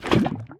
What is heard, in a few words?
fluid soak viscous; TFC Quick Pockets